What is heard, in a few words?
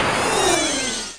WARP